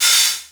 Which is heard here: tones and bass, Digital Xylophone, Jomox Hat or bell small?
Jomox Hat